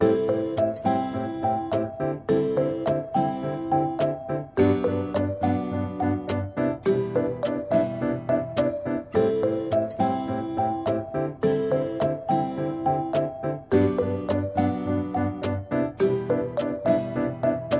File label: bgm comical chat; story